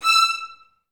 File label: VI E5, Advanced Orchestra; Best Service